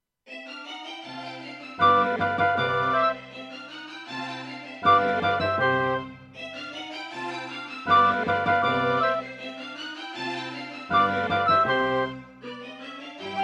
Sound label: Ensoniq VIVO 90DB-A; classical; Mozart; Concerto K467 partial